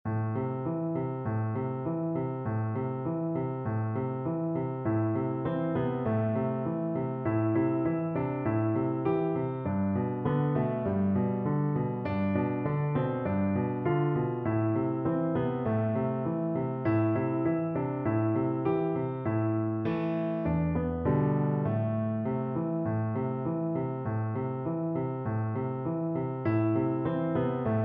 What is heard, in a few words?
sinner man, piano